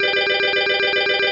Win Low, Slot Machine; Slot98

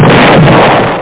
kaboom; Wanderer

